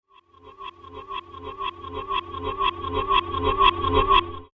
Closer To The Death Rays, laser sound

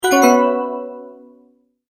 multimedia tone, Goodbye

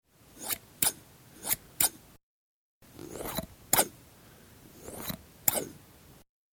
Scissors Snap, Snapping scissors several times.; Scissor Sound Effects

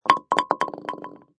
Sports Bowling Single Pin Knock Over

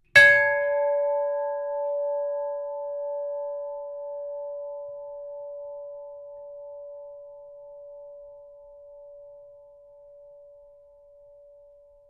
SHIPS BELL RING; Tomishima Maru 1952; Various ships bells; Boats (Sound Effects:Vehicles); SoundEffects-Foundation4

